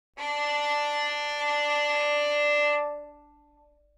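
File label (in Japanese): ヴァイオリン, 擦弦; 弦鳴楽器; sulPontic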